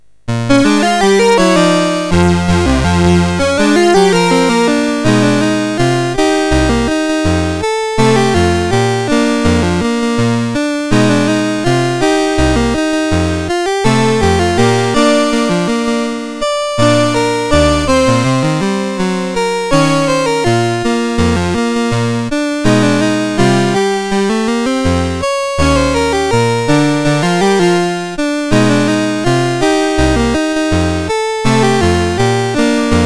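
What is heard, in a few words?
MOS 6581 SID chip; MOS6581R4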